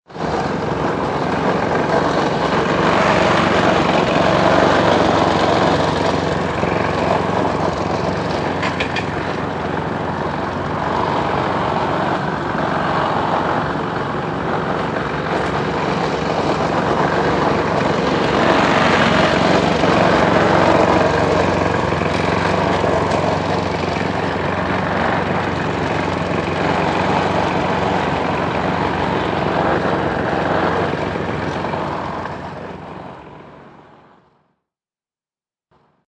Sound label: Lawn Mower, Older lawn mower running, being pushed around a yard, and cutting grass; Power Tool Sound Effects